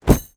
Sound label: drop item